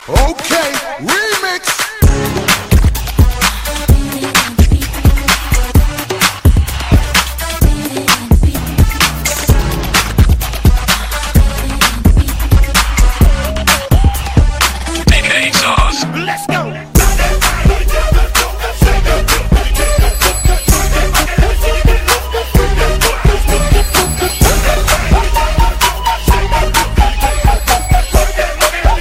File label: Rnb Remix